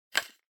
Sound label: Hourglass, Noise; タイマー機器